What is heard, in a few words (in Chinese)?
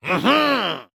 庆祝声; 卫道士